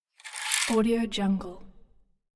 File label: household curtain drawn; blazznet